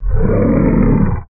dagon hurt

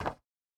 stone step, footsteps

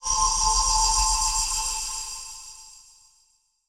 heal bomb

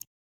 running water, river sounds

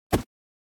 swing; abilities

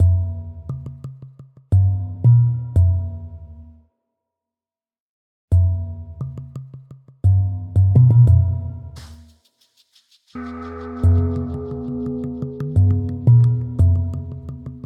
thrumming waters, soundtrack, dungeon

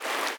fast water, river sounds

